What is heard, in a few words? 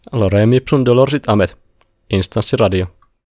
jingle; esanssi